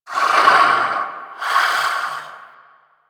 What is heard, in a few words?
Vocal Breathe; Warframe; Duviri; Dax; Vocal Set A